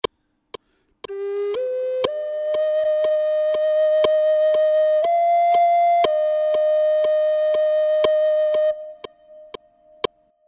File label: Flute; Kumbaya; Duets